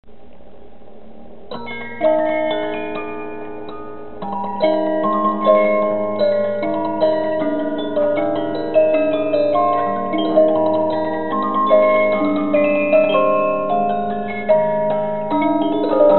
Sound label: musicbox